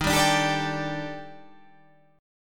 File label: D sharp Major 7th Flat 5th, down, chord